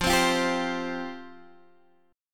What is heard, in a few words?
F Suspended 2nd Guitar Drop D, chord; x,8,10,10,8,8